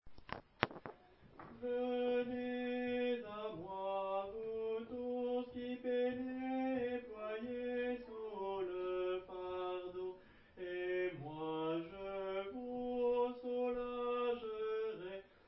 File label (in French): Couplet; qui regarde vers lui